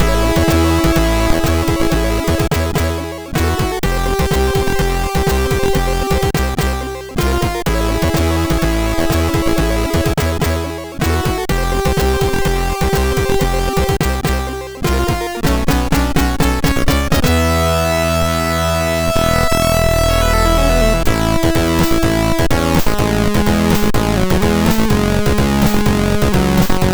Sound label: MOS6581R3, sid; Outrun